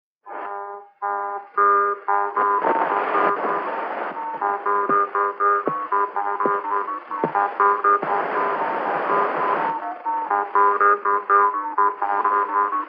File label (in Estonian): Labajalg, parmupill, folk; Lukata-tommata; Kirbla